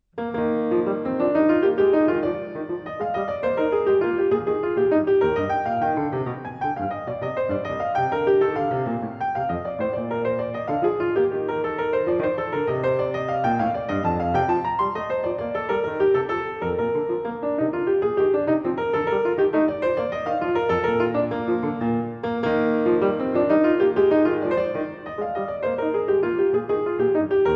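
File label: Bach suite Courante; Prantsuse suit4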